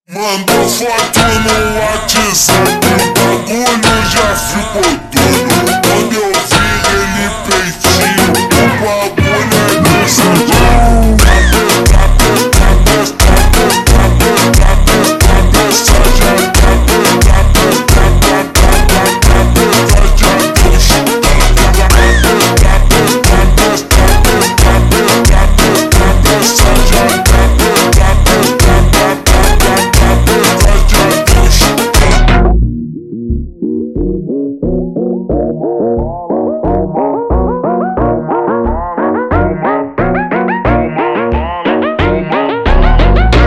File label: Montagem ultra Slowed